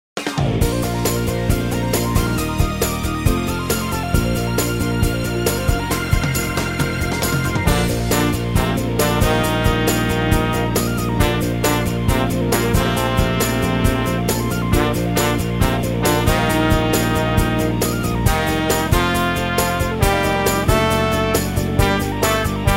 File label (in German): trompetentaxi